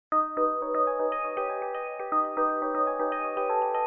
dreamy synth wave; SYNTH AND ELECTRONIC LOOPS

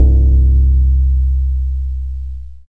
dx100 Timpani C3, Yamaha DX100; drum machines

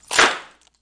urbantrap clap; Hip Hop; Urban Trap Kit; style kits

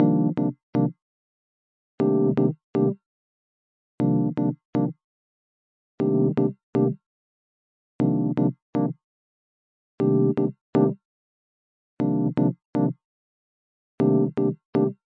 120 jazzy groovy phasing epiano; KEYS ORGAN PIANO LOOPS

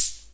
Ace Tone Rhythm Ace FR-1, drum machine; drum machines

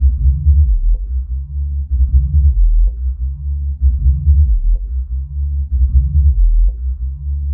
deep bass warbles; Basses

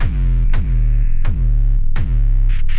172 offbeat kick breakdo; 161 to 180 bpm

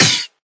SNARE, Volca; drums full kits; style kits; WallsVado Volca Sample Pack; Hip Hop

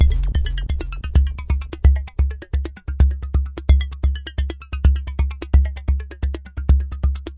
analog berlin, drum loops and breaks; soundsonic nks

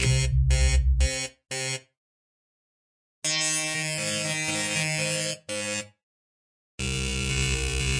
120 narrow synth; synth and electronic loops; melodic loops

